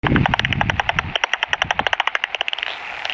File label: bat detector; bird; biodiversity; ikimachi